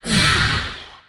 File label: injured